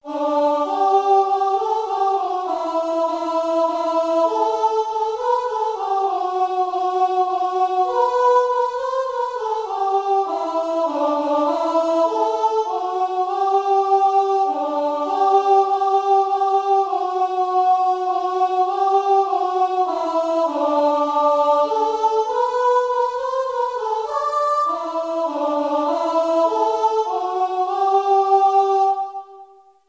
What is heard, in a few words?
we wish, keyboard